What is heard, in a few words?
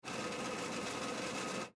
Atm Machine, Atm Machine Distribute Cash; Miscellaneous Electronic; Technology; Blastwave